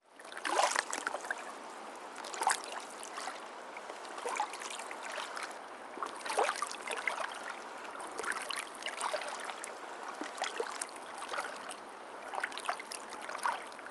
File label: river Huron lapping; Sonniss GDC